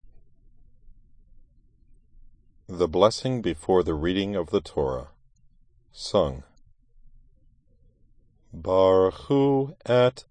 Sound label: torah blessing before sung